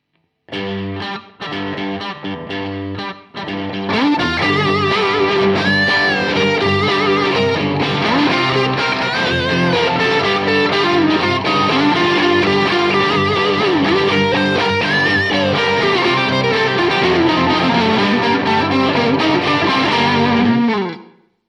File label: Strat treble boost